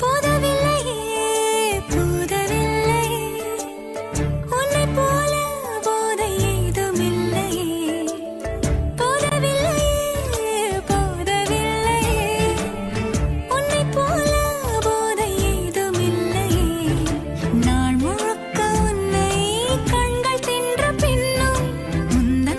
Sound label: Tamil